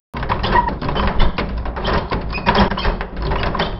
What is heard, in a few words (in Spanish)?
Dispensador de papel